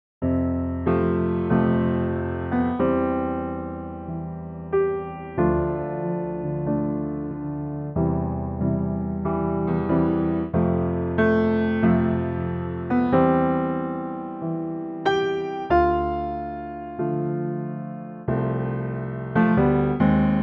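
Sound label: piano C, male; key - C - vocal range - C to F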